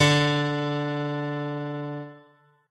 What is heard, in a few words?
piano; pianojs